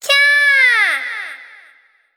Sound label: big Win Scream; netent